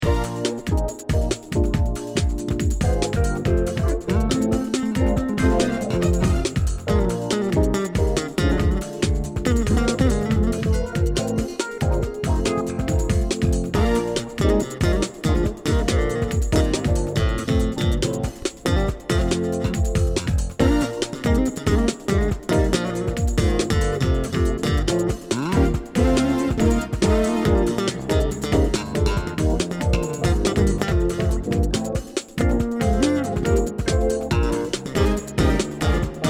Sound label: bass